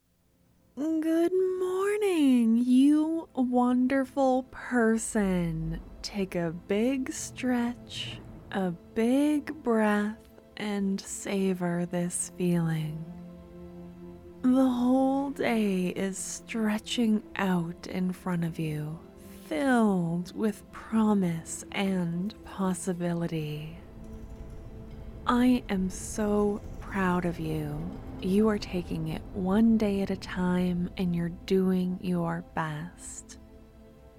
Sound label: Alarm; November - Good Morn